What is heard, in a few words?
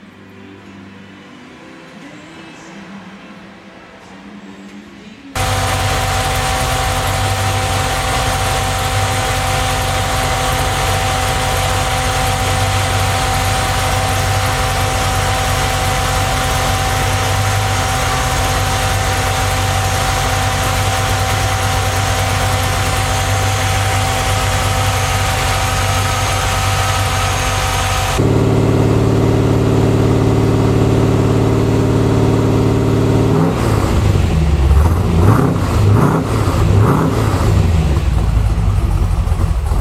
corvette; muffler